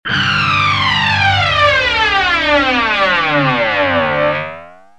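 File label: guitar boss bf1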